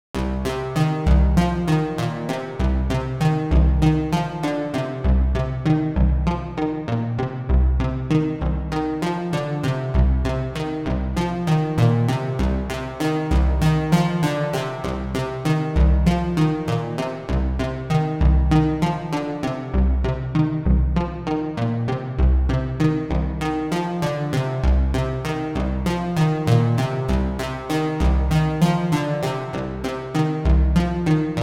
Eventide H9000 SP2016 Vintage Stereo Room; Repro1

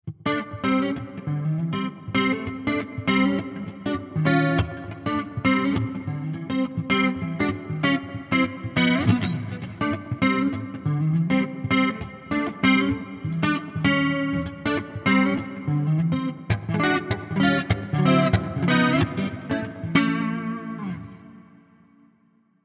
Neural DSP Soldano SLO 100 Cleans; Cleans of Saturn

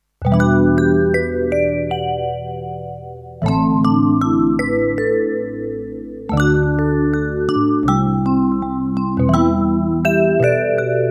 DX7IIFD Stereo Vibraphone

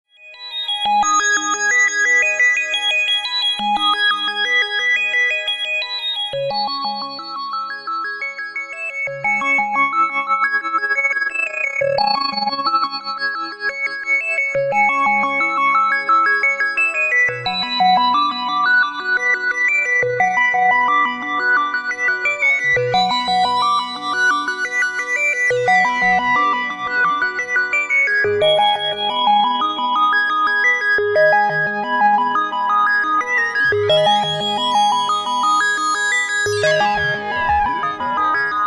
Amplitude mod

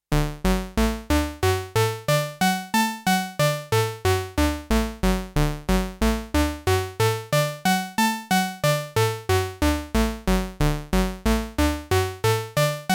Arpeggio

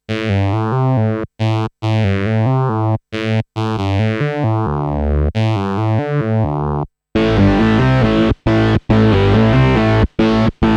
synthesizer; eventide h90

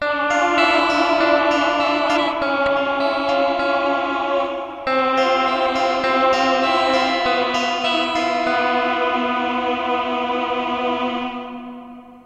Low Choir plus clean Strat

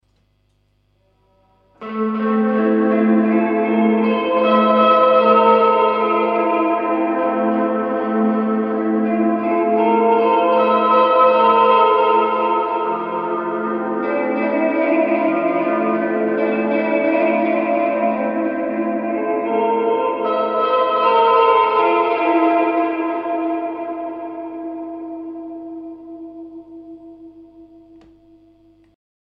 Delay Verb; Silverlake

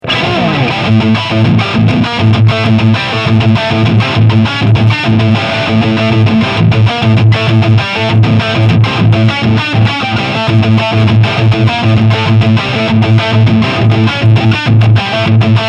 Lead

